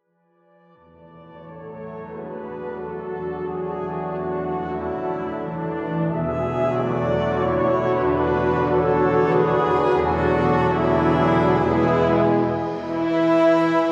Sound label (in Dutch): Fanfare; Fanfare Toeterdonk; Engelenburcht